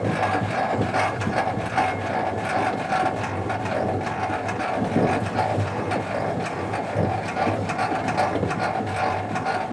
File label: turret; World of Tanks